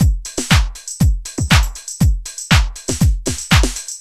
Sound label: High Energy, Drums Beats